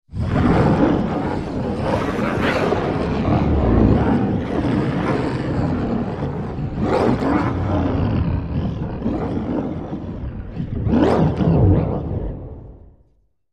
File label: Monster Vocal Eerie, vocal elements